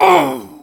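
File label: pain sharp, Russian; Soldier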